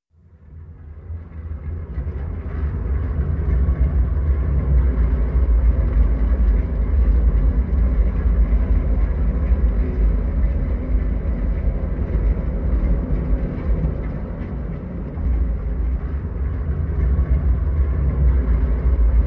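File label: Graveyard Rumble Debris, avalanche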